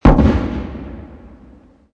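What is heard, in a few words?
explosion medium; amb elements; modern warfare 2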